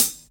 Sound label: Bright Hat Sound A sharp, Royality free closed hi hat sample tuned to the A# note